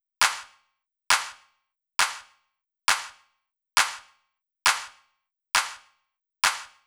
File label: 135 Clap; Zero-G Trance Inducer; 90 s Sample CDs